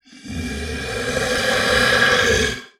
Animal Reptile Alligator Breath; animals; AdobeCC

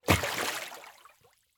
Liquid Water Water Hand Splash Single

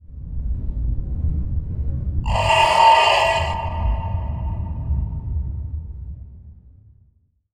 Production Element Title Transition Demonic

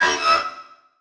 Transition Laser Warble; Production Element

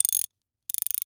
Industry Tool Wrench Socket Wrench Turning

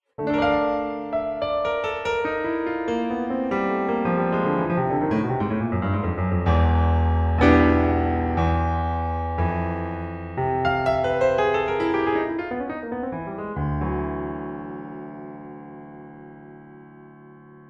Piano Between; Incompetech

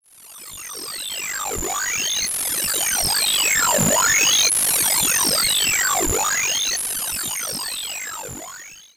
Science Fiction Sci-Fi Electronic Alien Scanner

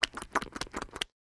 Animal Mammal Carnivore Dog Bulldog Jowl Flap; animals; AdobeCC

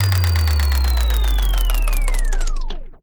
Transition Spin Down Descend; Production Element

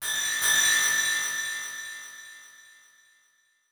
Title Transition Bell; Adobe; Production Element

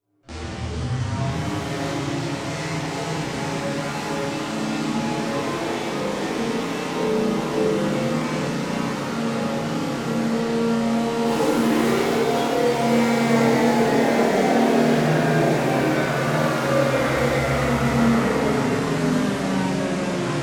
Pitch Drop Power Down; Adobe; Production Element; Imaging

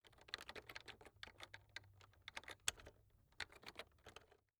Technology Keyboard Keys Typing

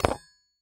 Weapon Knife Drop; AdobeCC

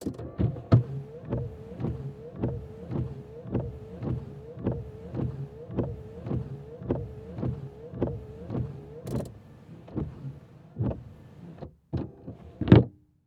Car Monte Carlo Interior Windshield Wipers Fast; transportation

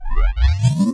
Sci-Fi Electronic Energy Swell; Science Fiction

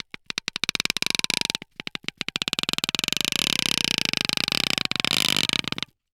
Tape Scotch Tape Pulled From Roll, Household

